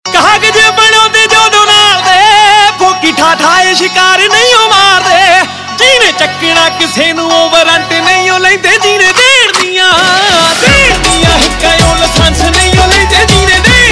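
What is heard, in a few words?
Ringtone, Punjabi Pop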